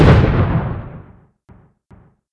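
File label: Explosion Flak; marketgarden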